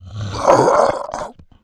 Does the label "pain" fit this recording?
Yes